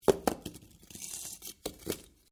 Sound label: Stone On Stone Small Impact; Material components for constructing more complex sounds; Drops; Objects